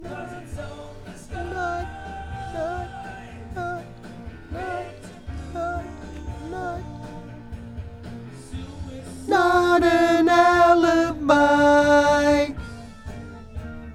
VOX Back

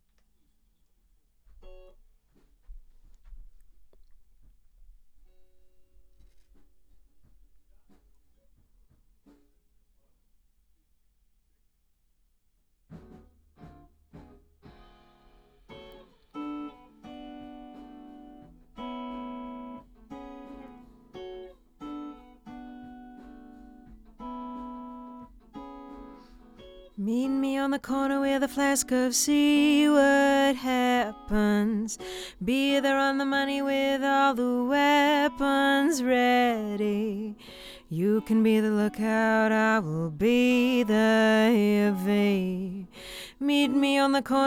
Ld VOX SM7

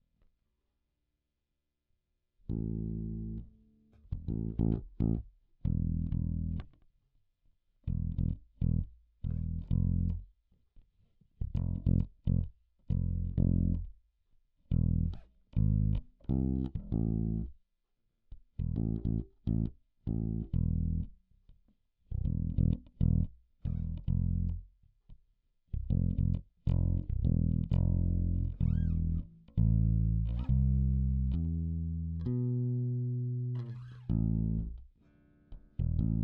Bass DI

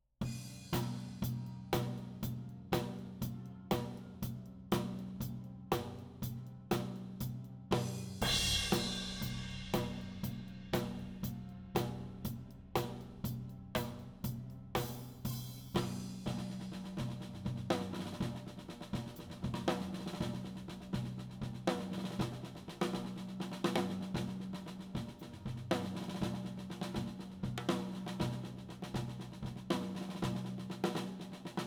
Tom M

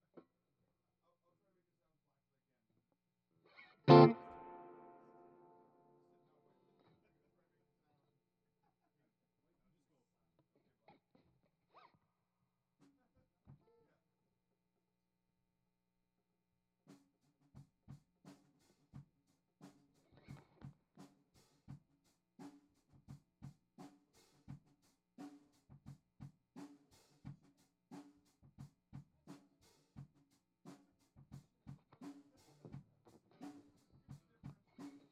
GTR

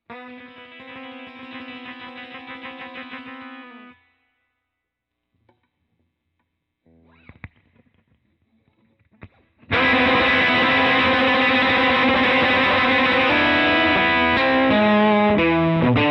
Gtr Solo

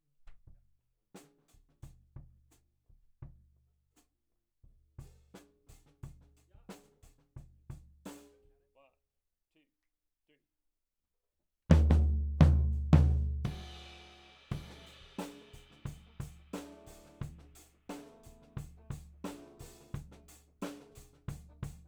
Floor

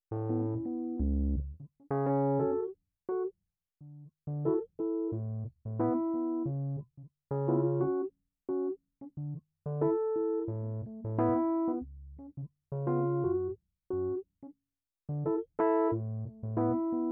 Keyz R

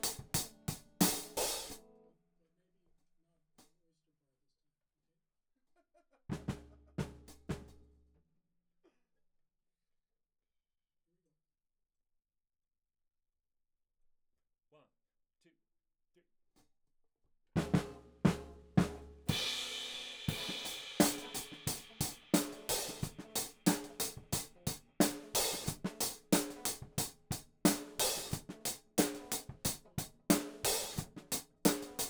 Hat

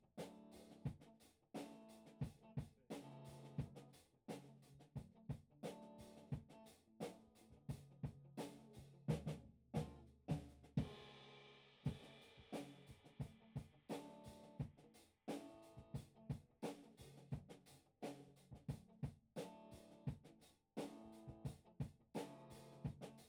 Bass Amp

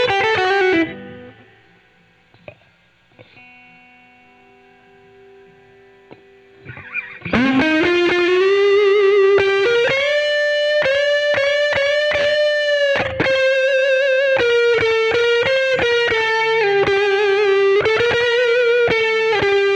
Solo Gtr

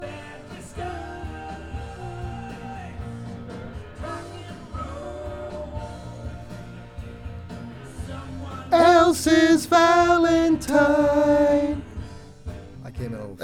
VOX Back

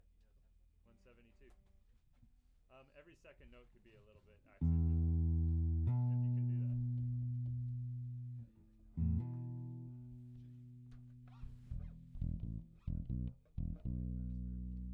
Rough Mix L

